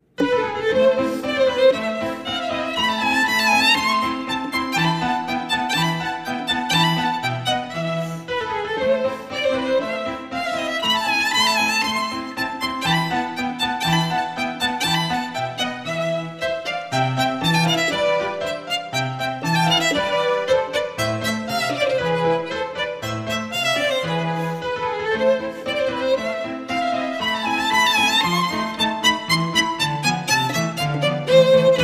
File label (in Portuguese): Marcha Turca